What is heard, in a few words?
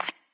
card slide